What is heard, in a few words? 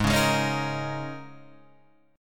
Gsharp Suspended 2nd Suspended 4th; strummed; 4,4,6,6,4,6